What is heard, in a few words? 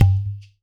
BOTTLE; Spectrasonics; Liquid Grooves; POTS + PANS